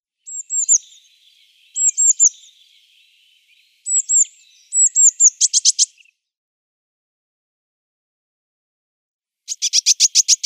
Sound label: Eurasian Blue Tit calls